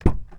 Panel Specimen Open, sound effects; Among Us

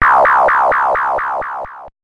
Death; fds nes; Dracula II; Noroi no Fuuin

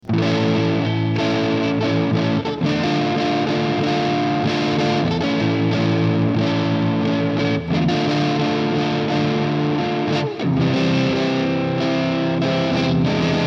Guitar drum; Hylics; Rockoid

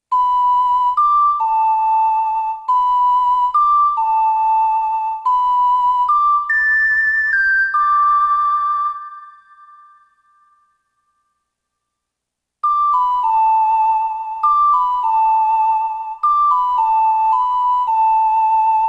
Ocarina Songs; The Legend of Zelda Ocarina of Time 3D; 3ds gamerip 2011

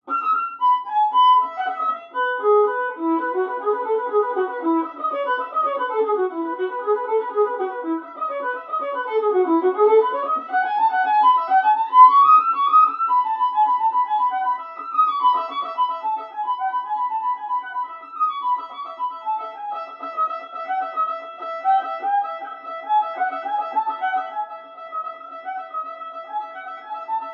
Violin; Assassin's Creed Syndicate

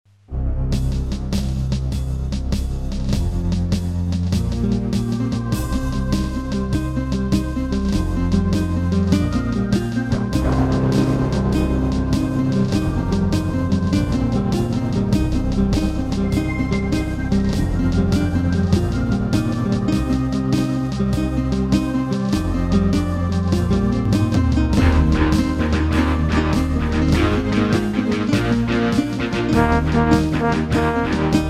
Title Theme Instrumental; Swords and Sandals 2

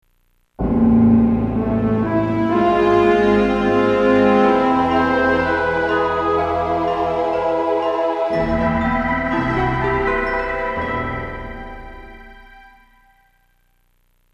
pyramid fire small; Indiana Jones and the Infernal Machine

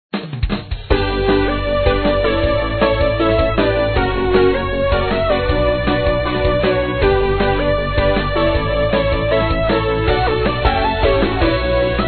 main menu sound; Monster Arena

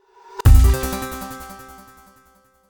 Stings - Mini Game Notification; hot dogs hot girls

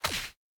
Spawnin Land; Boomerang Fu

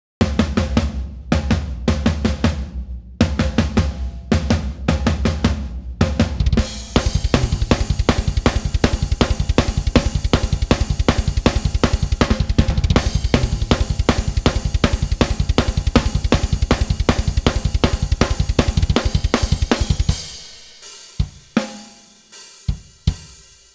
Drums I

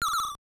shot; Gradius; MSX

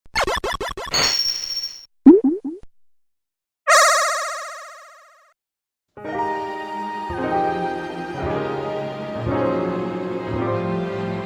Big Band Jazz Piano

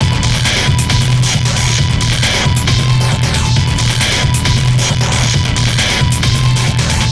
Alien Battle; Lego Battles 2009 NDS

